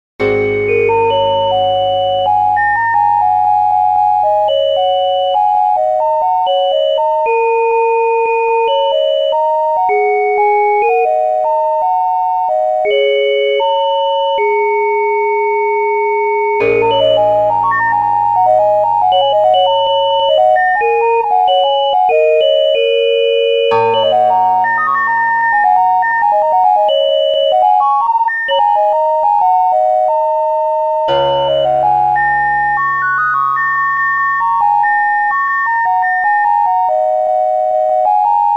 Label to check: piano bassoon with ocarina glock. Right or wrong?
Right